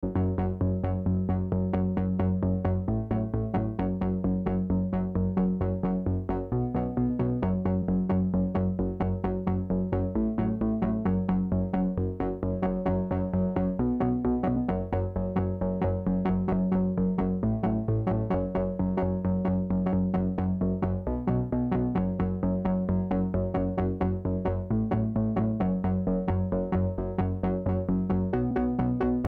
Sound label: arp wet; smasher